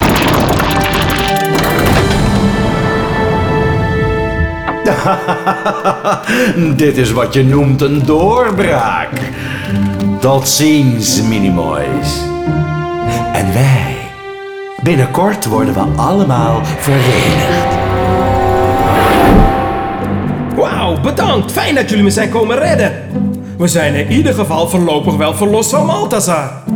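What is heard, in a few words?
Outro Victory Dutch; arthur and the revenge of maltazard; wii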